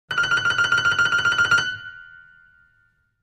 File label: Piano High Trill, keyboards piano